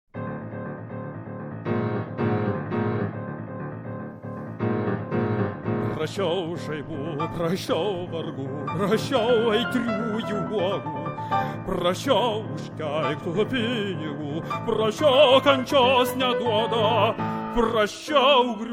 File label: vocal cycle Breathing II, tenor